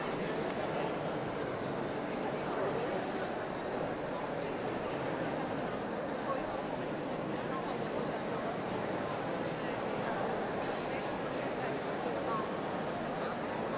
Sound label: Audience Becomes Still